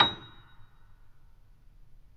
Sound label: MP A#6, Grand Piano; AKAI S6000 CD-ROM - Volume 2; Grand Piano 256M